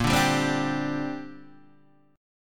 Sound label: A sharp 6th Add 9th, Guitar DADGAD